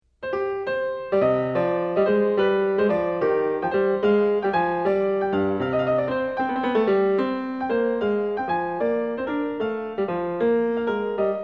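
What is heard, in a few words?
gigue, piano; French Suite 3; BACH; FRENCH SUITES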